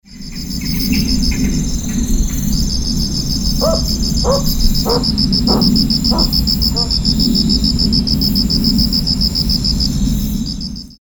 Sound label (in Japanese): ryukyuookonohazuku call; yanbaru